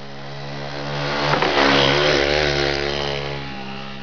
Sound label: bike drive by